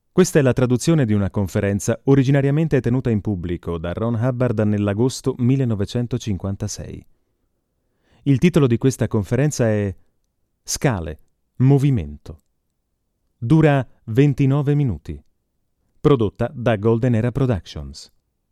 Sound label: scale